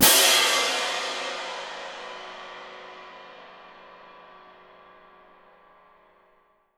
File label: CYM F, Percussion; Miroslav Vitous - Percussion & Harp